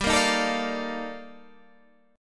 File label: D sharp Major Sharp 11th, G